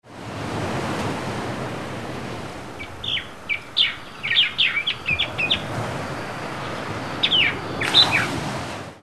Tropical Rainforest Coral Reef Surf with Birds

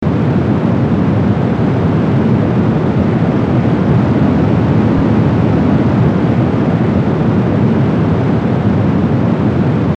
WHITE NOISE

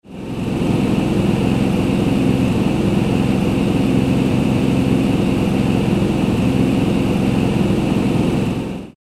Airplane Ride; Sounds For Sleep

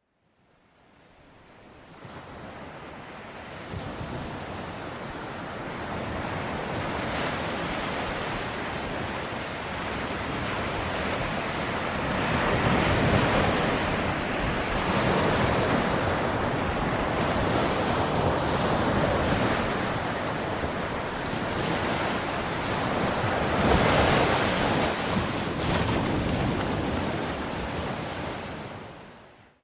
maui surf; sound oasis

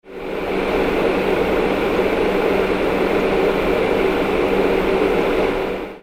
Fan; Sounds For Sleep

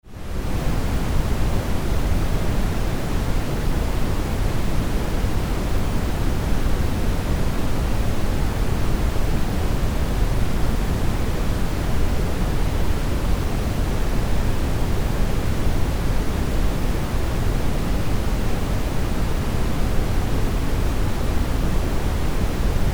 brown noise; sound oasis

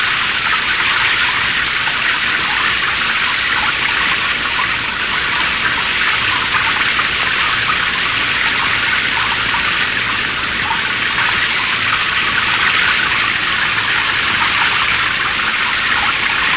Rythms of the Sea Ocean Rain

